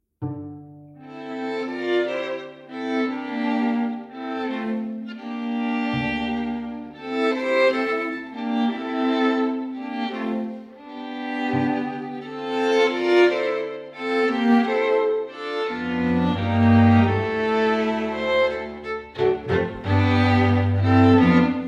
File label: String Quartet in D Minor; 1st Movement